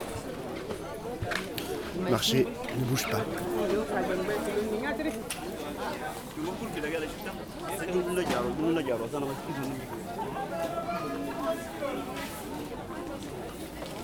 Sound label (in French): Marché commercial du dimanche - Ambiance statique, Angers; ZoomH2N; Ville; Javras